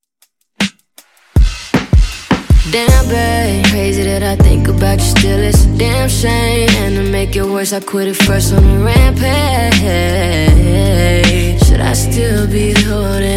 Deep Dj Intro Outro 79bpm; remixplanet

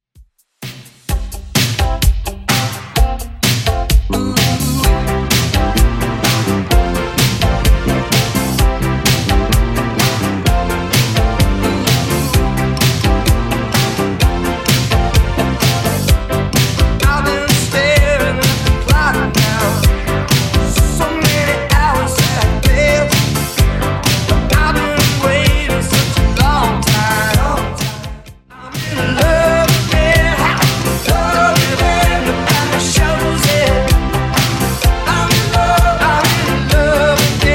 80s Redrum 128bpm; remixplanet

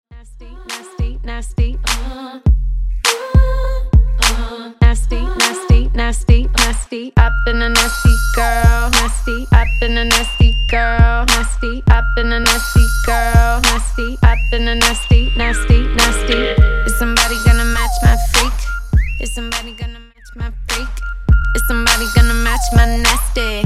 Party Mashup

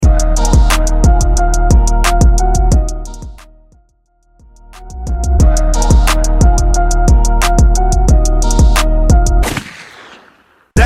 Hip Hop Rap; Mainstream VIP; Mixshow Hype Into Extended Mix; Vegas Edit; 89 Bpm

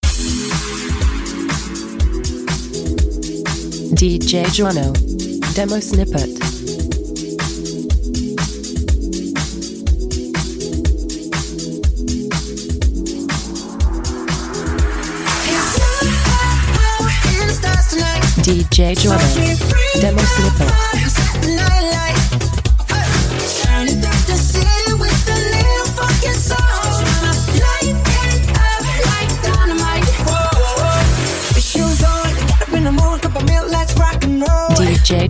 Funk House 122

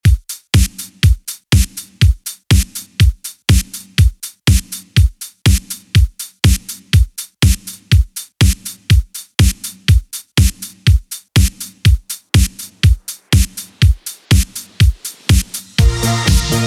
80s Redrum 122bpm CLEAN

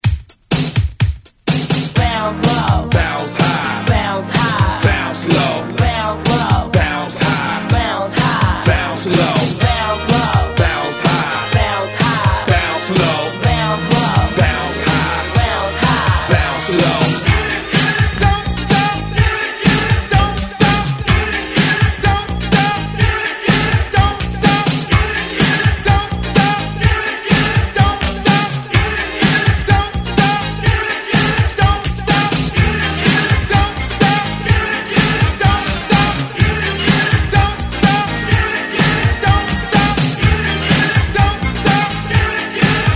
80 Hype ReDrum; DJDOLLS

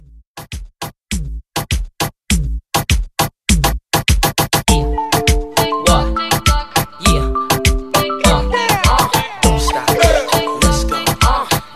Dance Re Drum 101 BPM

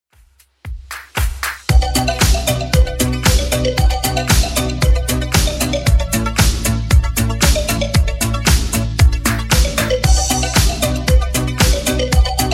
80s Redrum 115bpm

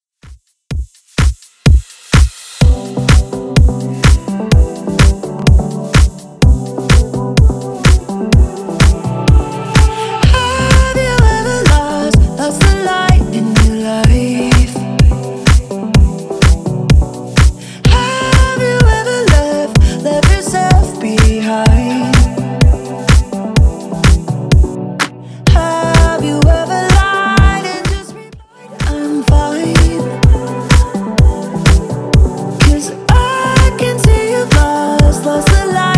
Top40 Redrum 126 bpm

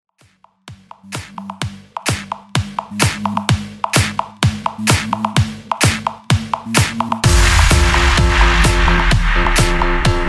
Electro 128 Bpm

